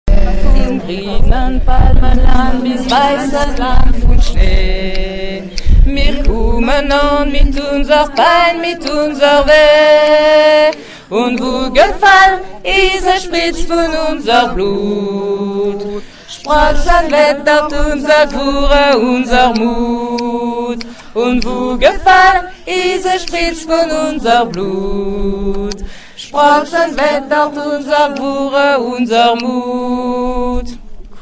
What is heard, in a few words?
German Alto